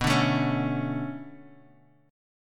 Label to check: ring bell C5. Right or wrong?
Wrong